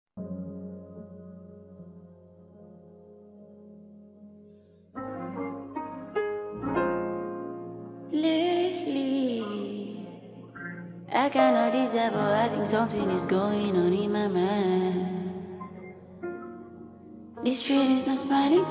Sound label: Open Verse Challenge Instrumental